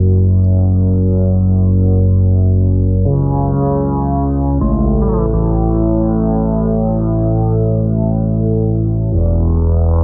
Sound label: intruding guitar; Loops; Electronic